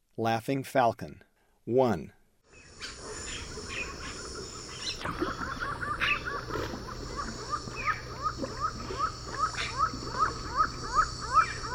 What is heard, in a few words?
Laughing Falcon, Birds of Ecuador; S. America